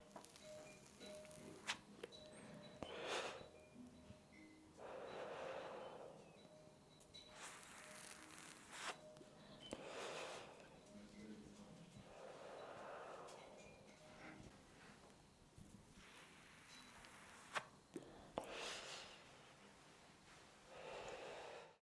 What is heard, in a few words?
Smoking A Cigarette